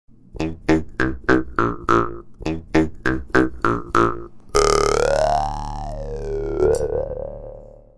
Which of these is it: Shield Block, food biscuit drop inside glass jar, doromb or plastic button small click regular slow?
doromb